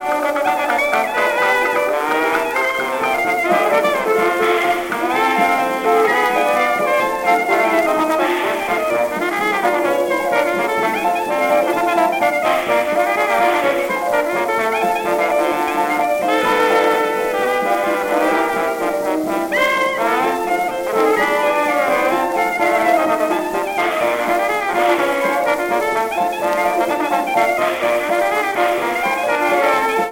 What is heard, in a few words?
jukebox